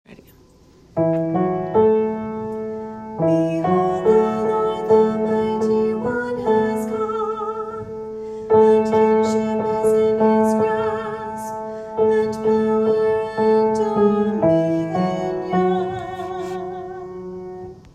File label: Entrance Antiphon; Epiphany Day